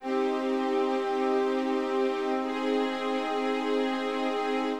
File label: Sustain, струнные